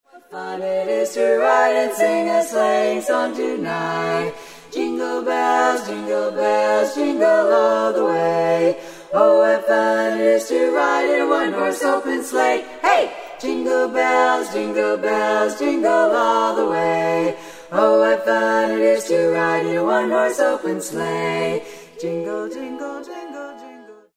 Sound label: jingle bells